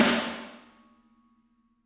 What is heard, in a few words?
Snare Hard